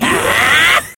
sky attack ready

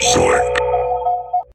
robot missile

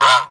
Scream

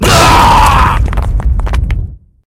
counter

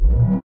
circle beam ready

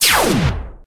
antena beam fire

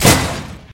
tornado hit

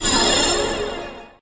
bonus result

